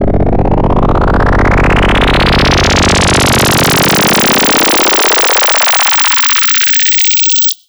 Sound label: FX Uplifter